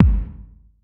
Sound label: kick; skunkworks